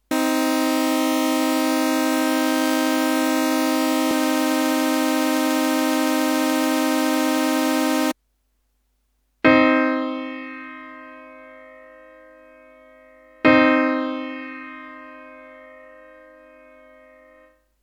kleine terz; harmonie

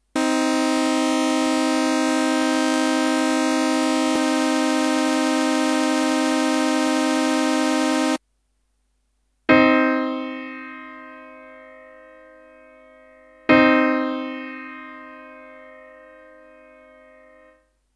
kleine terz; harmonie

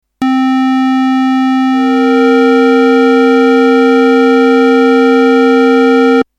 kleine septime; harmonie

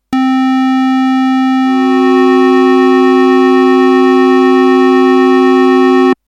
tritonus; harmonie

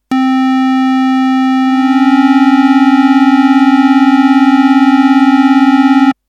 kleine Sekunde; harmonie

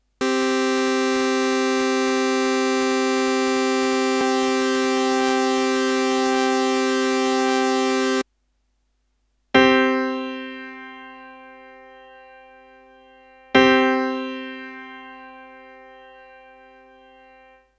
quinte; harmonie

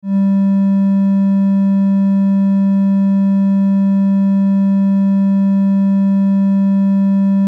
dreieck; harmonie

